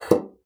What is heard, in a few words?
BASSOON; Xsample VOL-6